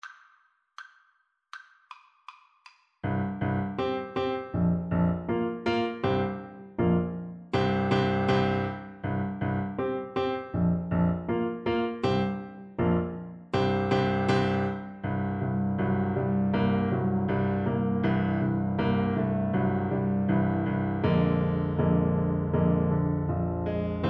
thumping blues

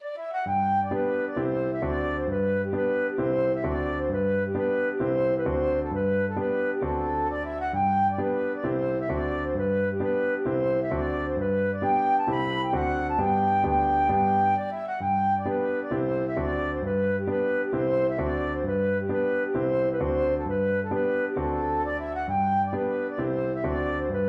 hornpipe flute

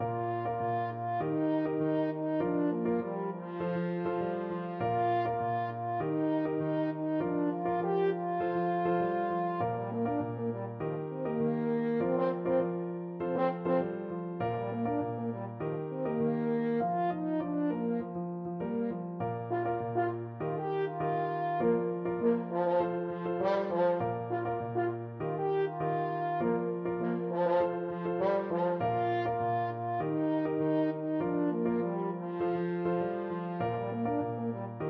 township toon, french horn

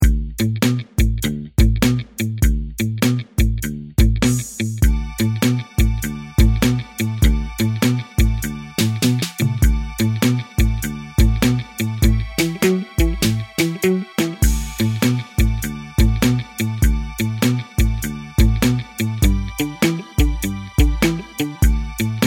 violin; rock island line

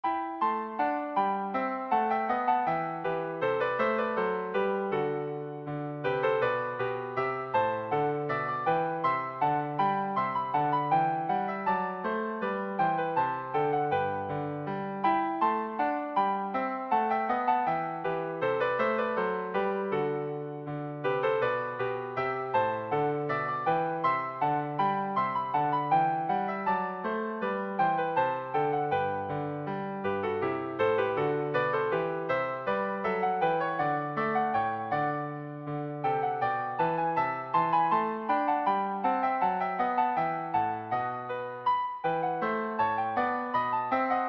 bourree, 2 violins cello

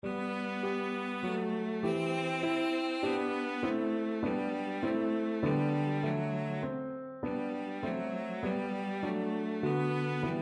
minuet, cello

